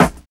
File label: STREET SN; East West; Phat & Phunky